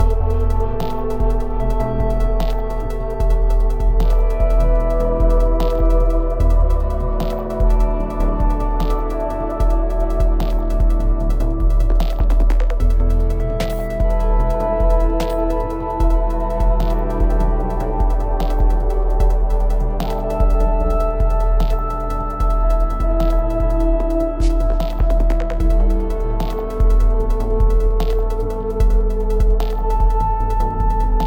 Theme Mayhem; Smash Hit Original Game Soundtrack 2014

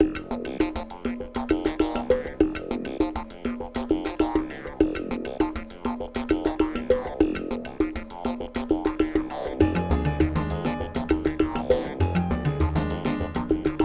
Underground; super mario maker 2; SMB3 Underground - Edit

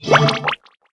Object Well; Inotia 3

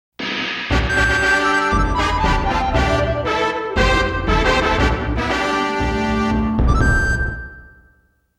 Congratulations!; Tamagotch Minna de Tamagotch World Original Soundtrack 1997

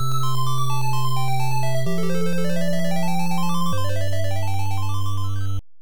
STAGE CLEAR; hi no tori hououhen gaou no bouken family computer

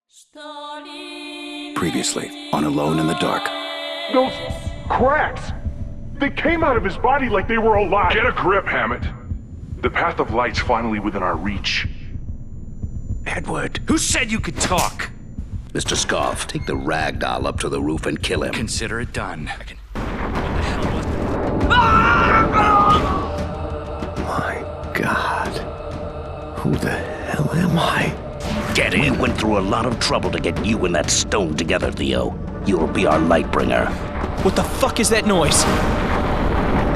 Collapsing Building; Alone in the Dark; PS2 PS3 Wii Windows Xbox 360 gamerip 2008

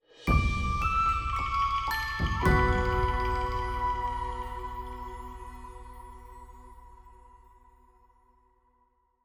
complete Quest Stinger

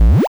drink 8b

